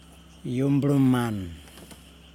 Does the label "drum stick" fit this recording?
Yes